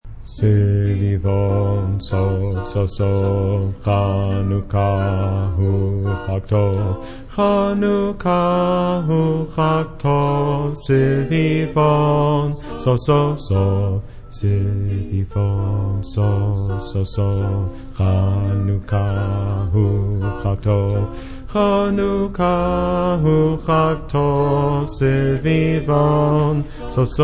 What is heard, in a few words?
basses